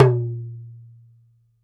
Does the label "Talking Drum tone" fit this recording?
Yes